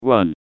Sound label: pyro taunt rps exert